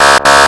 claxon 2x

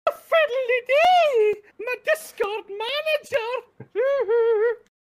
fiddle

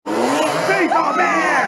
chainsaw

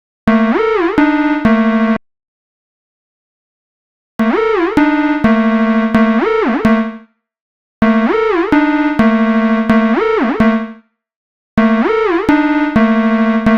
Synth Recording; Ableton

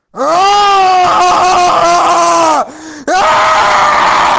roarr

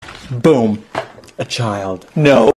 a child; vine sound effect

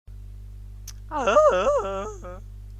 lip smack

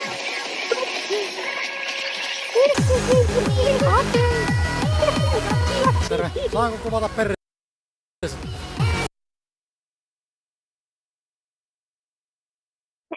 bwahhahah

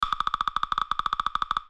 woodpecker

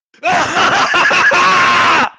Mad laugh; Mad Laugh meme

